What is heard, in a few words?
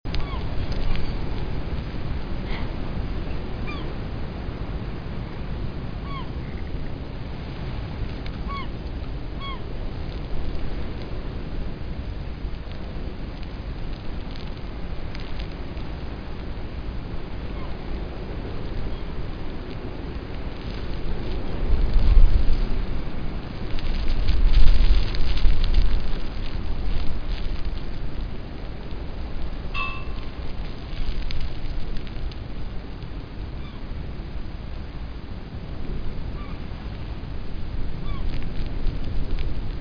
sea; weltdemo